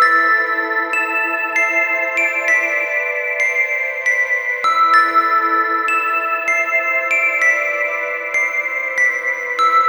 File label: Loop